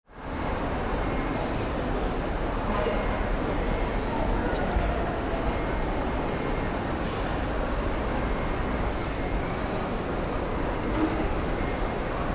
large shopping mall crowd

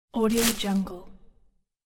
bashing tree branch with leaves; کنار زدن شاخه درخت پر; nature sounds

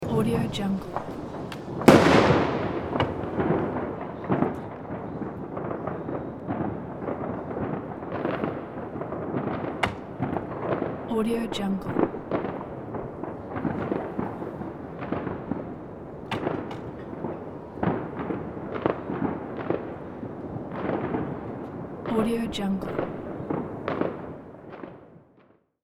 fireworks rocket flying overhead; nye; urban sounds

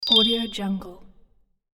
interface infographics bells twinkle short; interface sounds

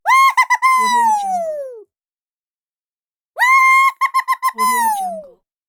fun woohoo; cartoon

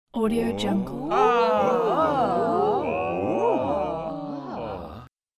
mixed amazed group; تعجب گروه جاخورده; human sounds

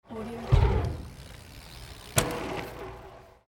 bicycle kicker ramp jumping; urban sounds

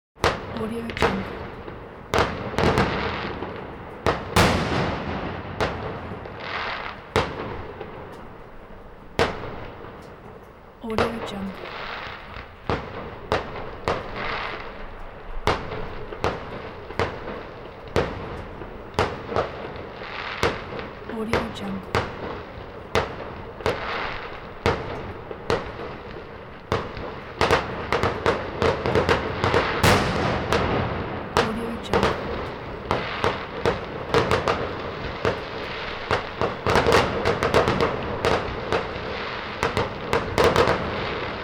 fireworks; تیراندازی; urban sounds

